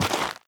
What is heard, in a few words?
step light, footsteps, gravel; player